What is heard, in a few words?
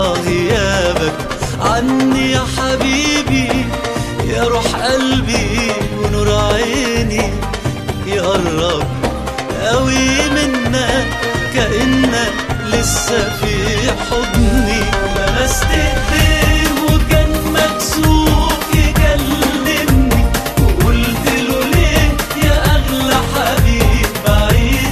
Arab